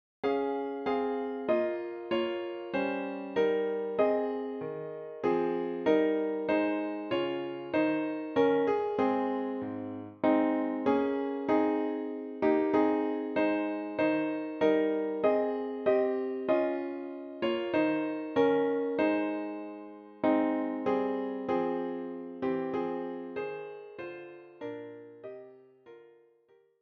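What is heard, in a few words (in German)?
Klavier; Hark The herald angels sing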